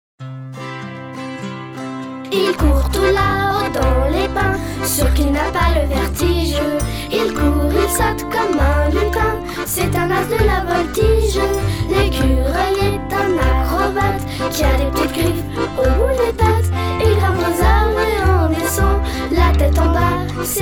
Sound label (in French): Chanson l acrobate